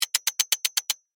Steampunk Gear Lever, Scifi, Steampunk, Gear, Lever; Science, Fiction; BlastWave